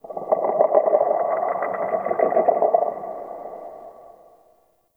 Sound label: Texture Low Croak; Ambience; Dungeons; Under City